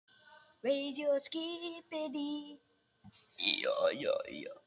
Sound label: goofy ahh